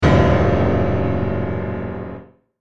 ガーン

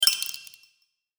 凍る

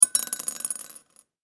陶器で チンチロリン

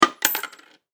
お賽銭

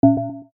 決定

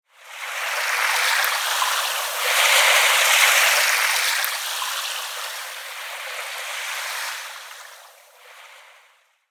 さざ波 フェードアウト

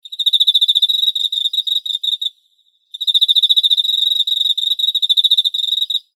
エンマコオロギ 短め

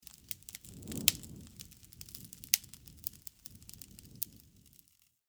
焚き火 短

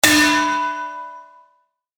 なべで叩く

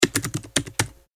タイピング パンタグラフ短

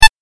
文字送り 単; ボタン・システム; システム音

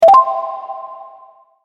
正解

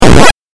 8bit ダメージ

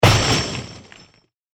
破壊音 短い

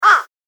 カラス

